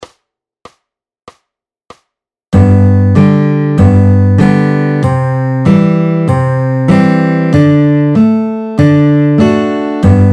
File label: Slow, fingerpicking